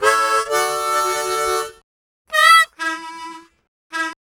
Harm 098bpm A, Harmonica

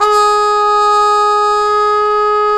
BRS CPM TP, Cup Mute Dry; Roland L-CDX-03 Disk 2; Cup Mute Tpt

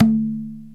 E Indian Perc; Digital Sound Factory

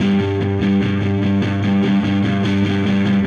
Dirty Chunk Guitar, Indie Rock

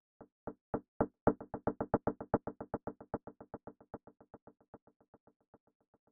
fx knock knock

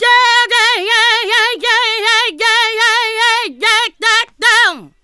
YEAH YEAH, Funky Vocals; USB Soundscan vol.18

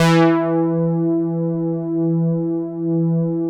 OBERHEIMS

